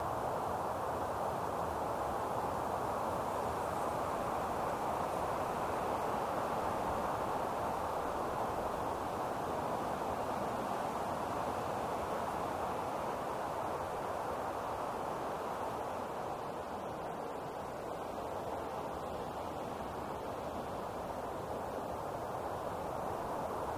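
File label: outside lo loop; The Sims 3; Windows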